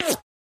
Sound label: Bullet Ricochet